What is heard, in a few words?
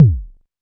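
technox bass drum; Quasimidi Technox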